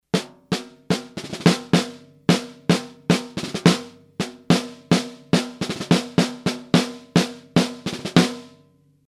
pearl Snare sticks

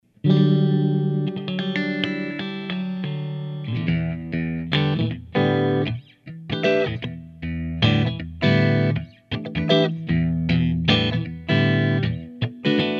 C3 clean

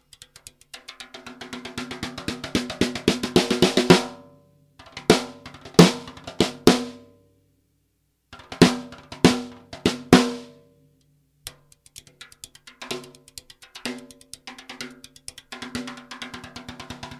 pearl Snare bundles

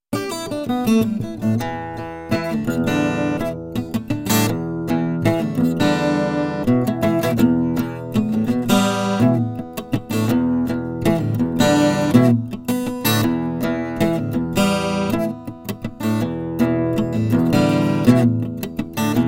Hype Stereo 12string

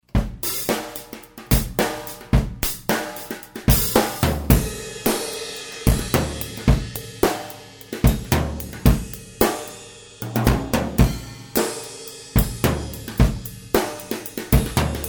mono drum overhead

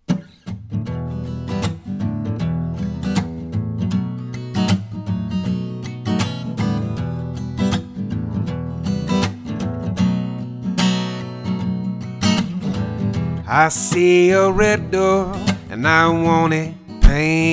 Atmosphere metal string voice

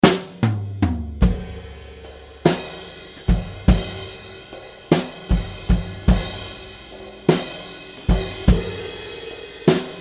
overhead

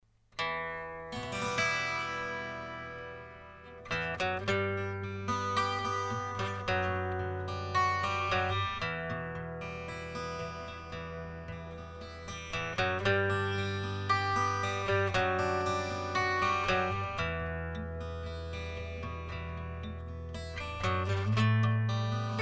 12string

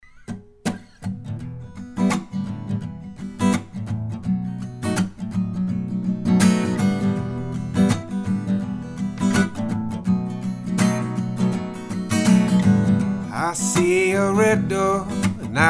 metal string

